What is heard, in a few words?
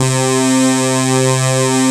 JP 8 SYNS; Pro-Rec - Synthsonic